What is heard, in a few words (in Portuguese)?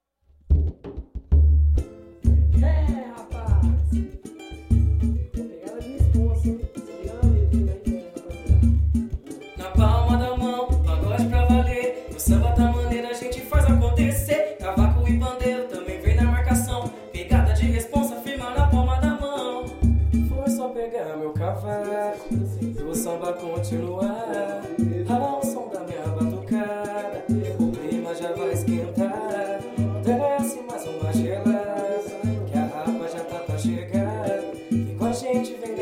musica minha batucada